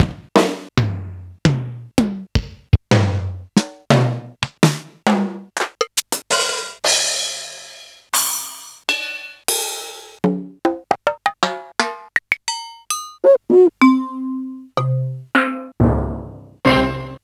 Yamaha DD-12

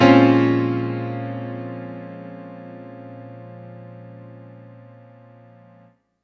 Piano stack (Bb) min (jazz); Sonic Foundry (Sony Creative Software); Ugly Remnants VOL-2

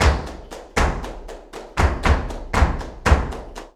BULERIAS TAP, Flamenco

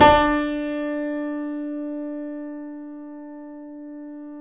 KEY, YC7 Piano ff

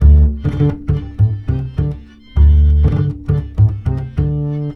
MEH2 BASS

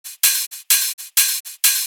Hihat Loop 128 BPM

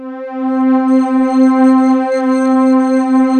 WARM SHIM, JV-1080

